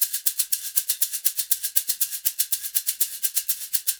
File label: SHAK, Percussion Loops